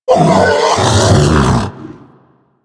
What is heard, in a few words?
Predator Vocal Captured Idle, Hunting Critters; Warframe